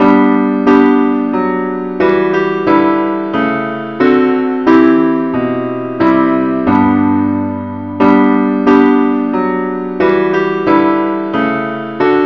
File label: parts only key G